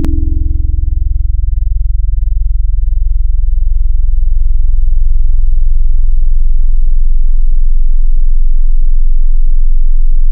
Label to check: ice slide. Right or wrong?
Wrong